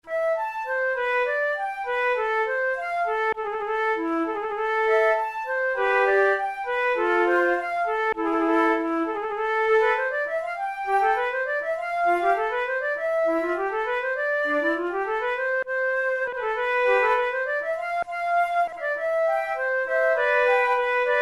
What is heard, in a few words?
two flutes; Monteclair; 6 concerts; la picarde; flute tunes